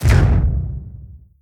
Monster Foley Footstep; Monster Generic Foley Footstep 03

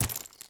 spike; aquariumboss

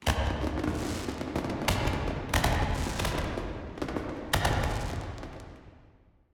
Fireworks; CutScene 02

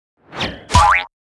Pyon Small Attack

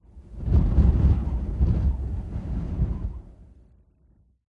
Golf Crowd

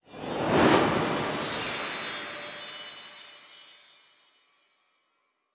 sfx player Light Fly; finalCutScene

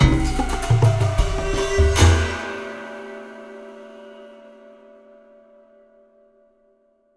Combat loop neu loose; Combat-loop-neu-loose-B; curious expedition 2 macos ps4 switch windows xbox one xbox series xs gamerip 2021

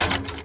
Wall trap prepare; Folk Hero

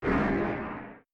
Dark Monster voice death; enemies

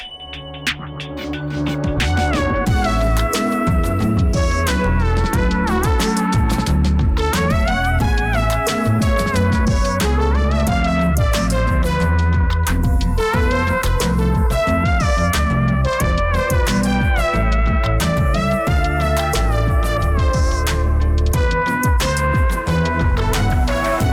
Lofi Beats; the jackbox naughty pack

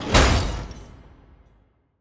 Sarco Slam; Legend of Keepers

